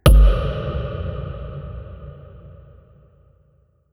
space impact; mixkit; cinematic space impact hit

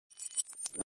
Lens flare transition

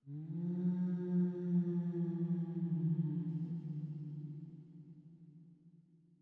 Ghost Sound Effect

